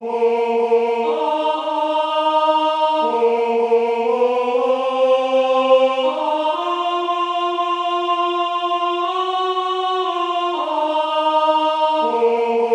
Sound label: Alto